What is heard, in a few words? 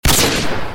MW2 Intervention